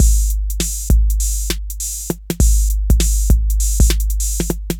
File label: drloop, drumloops, Vinyl Attack; Masterbits